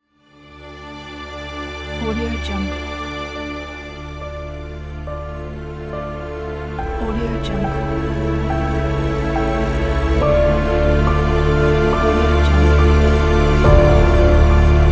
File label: cinematic apocalypse logo